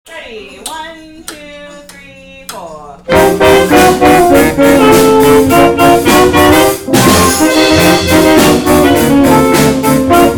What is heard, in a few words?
Jazz Band Practice; York House School; Vancouver